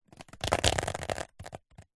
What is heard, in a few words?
water drips short sudden onto umbrella; nature